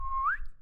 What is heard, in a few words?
sent